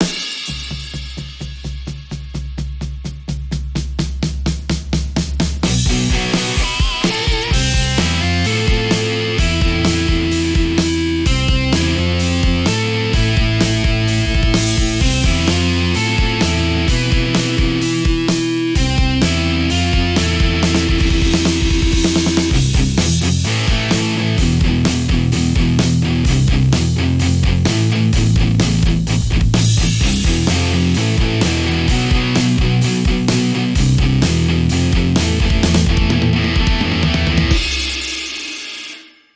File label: generic rock; opus 64vbr wide 10cmplx; Audio Engineering Considerations for a Modern Mixnet